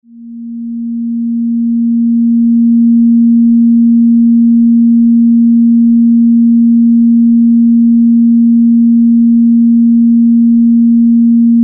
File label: b flat sine wave; pure sine wave b flat for tuning